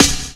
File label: Snare